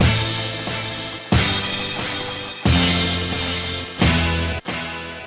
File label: guitar hard stabbings